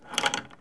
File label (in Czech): retizky; Mafie 1